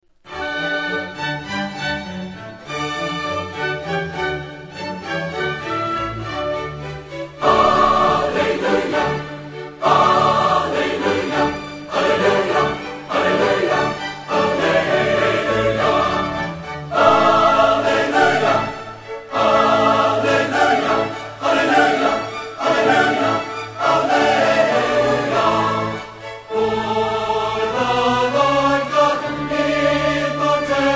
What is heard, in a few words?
Choir And Orchestra, Hallelujah Chorus; In Holy Songs Of Joy